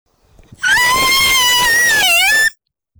pterodaktyl

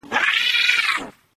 scream

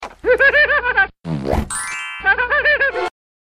hihihehehaha

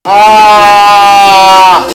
aaurhaahhhhh

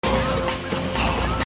hoo hah

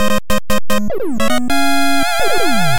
mega man 2 game over

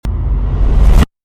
reverse boom

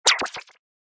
ka pow

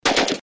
ak shot

